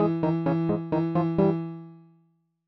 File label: jingle bells